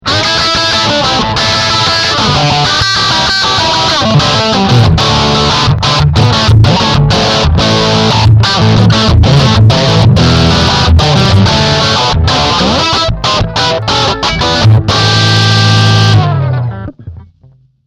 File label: Medium Crunch Neck Pickup